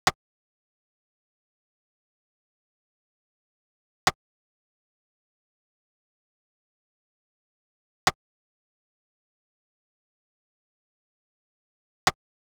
Perc 120BPM

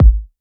Kick E

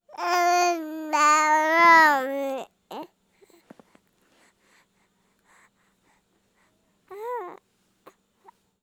baby talk pleasant

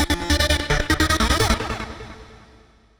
Gated Synth 150 A

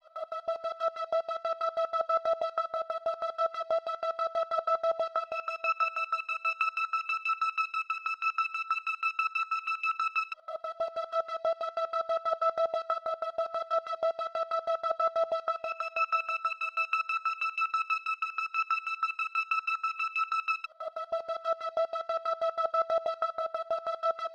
186 BPM E Min Pad

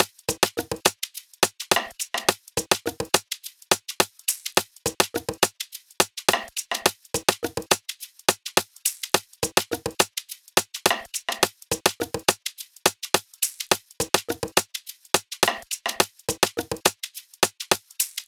105bpm Drums Top

